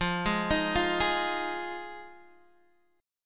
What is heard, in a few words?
F Minor 7th, F, Guitar, Open D; down; chord shape